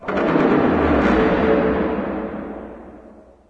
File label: strider distant; Garry's Mod; hl2; streetwar